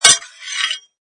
Sword Strike And Swipe, Sword hits other sword and scrapes; Sound Effects:Combat; Lynne Music